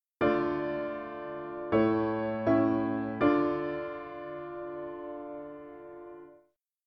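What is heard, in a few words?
piano, dictation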